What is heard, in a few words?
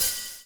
BUBL, BUBBLE; Spectrasonics - Backbeat